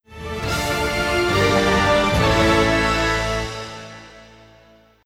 Battle Stinger Complete; Zak Storm Super Pirate; mobile

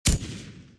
Minigun Fire

